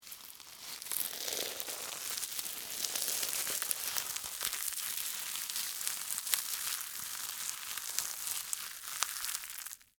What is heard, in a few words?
Crunch Ice Cream Cone, Soft, Debris; Texture; BlastWave